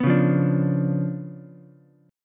C sharp 7th Flat 9th, Ukulele, C6; strummed; chord

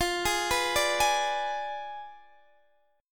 F Diminished 7th Guitar Open D, chord

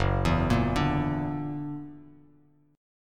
F sharp Augmented 7th, Bass Standard; arpeggiated; JGuitar

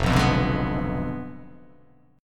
F Diminished 7th F down

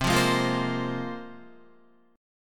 C 7th Suspended 4th Sharp 5th, Guitar; C7sus4#5 strummed; JGuitar

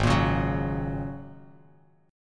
Ab 9th Suspended 4th Ab down Bass Standard; Ab9sus4 strummed; JGuitar

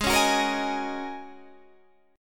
G sharp 9th Suspended 4th, Guitar, Open D; down; chord shape

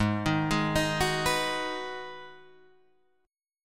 G sharp Minor 7th G sharp, Guitar Open D

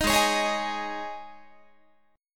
Ab Suspended 4th, Guitar; strummed; Absus4; 18,16,16,18,19,19

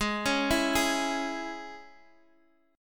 Ab Suspended 4th Sharp 5th, chord; arpeggiated; 13,13,12,11; JGuitar

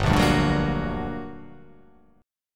G Minor Major 7th G

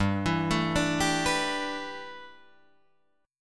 G 6th Add 9th G Guitar Drop D, chord

